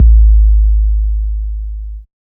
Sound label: Bass, Dubstep 808 Basses; GhostHack